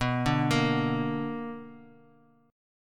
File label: B Minor Major 7th, Bass Standard; arpeggiated; JGuitar